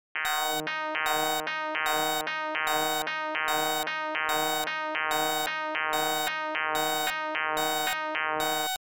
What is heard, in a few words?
Swing Example